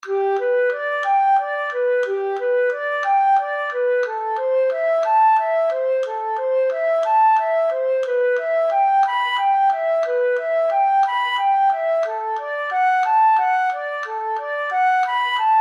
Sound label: for Flute